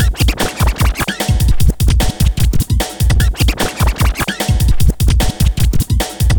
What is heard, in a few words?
150 scratch